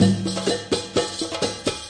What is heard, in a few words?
126 mideast simple, sampled music loop; MELODIC LOOPS